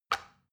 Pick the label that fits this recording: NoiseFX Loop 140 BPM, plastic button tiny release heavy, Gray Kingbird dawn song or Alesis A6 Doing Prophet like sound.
plastic button tiny release heavy